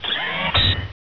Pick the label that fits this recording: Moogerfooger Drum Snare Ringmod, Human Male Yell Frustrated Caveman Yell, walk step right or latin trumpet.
walk step right